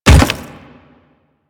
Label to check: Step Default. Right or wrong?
Right